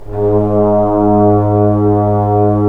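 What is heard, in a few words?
trombones; Roland LA Composer vol. 4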